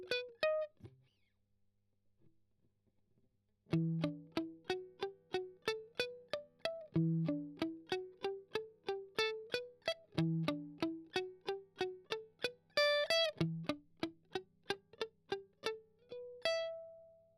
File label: Strum Octav Arp